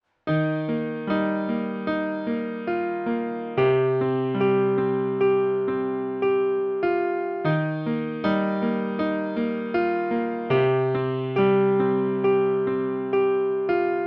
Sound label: version Piano remix